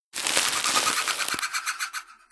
spooky treatbag pickup

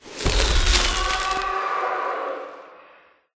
spooky treatbag use

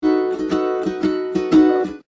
instrument nukulele lead

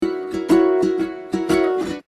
instrument nukulele rhythm

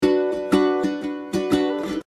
instrument nukulele rhythm

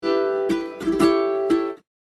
instrument nukulele lead

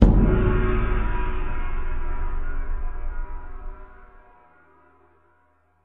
wasteland gong

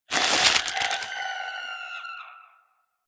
spooky treatbag pickup

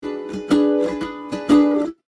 instrument nukulele lead

